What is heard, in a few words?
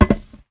metal footstep